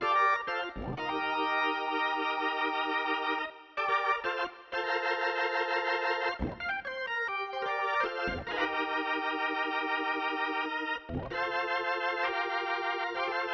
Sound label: organ D, Smooth RnB, Gmin